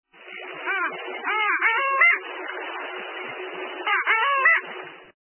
lodówka, głosy

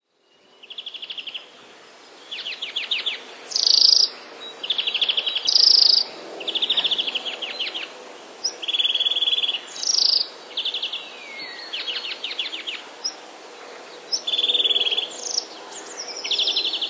dzwoniec

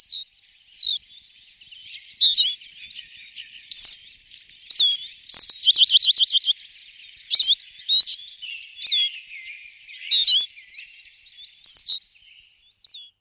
nawoływanie czyżyka na tle innych ptaków

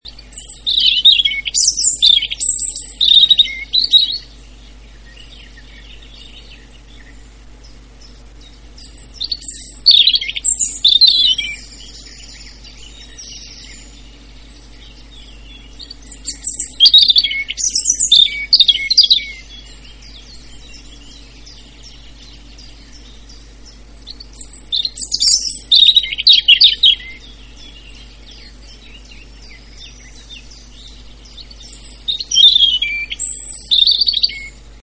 rudzik - w oddali pierwiosnek i inne ptaki; wroblowe; turdidae

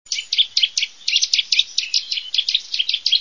kowalik, głos wabiący

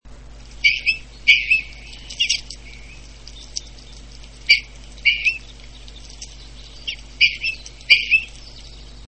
krzyżodziób modrzewiowy - nawoływanie

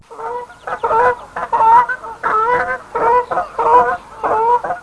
gęgawa - głos

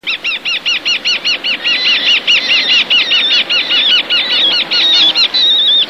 Sokół skalny - Falco eleonorae

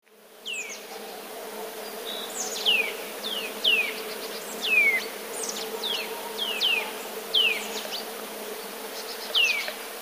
kowaliki śpiew, gdy wiało i padał; las

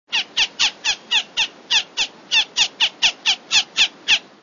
błotniak zbożowy

